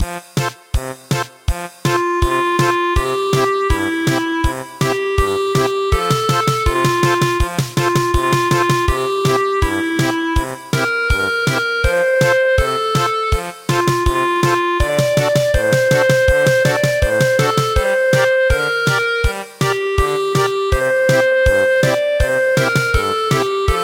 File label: German Polka